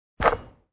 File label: Hoop Swish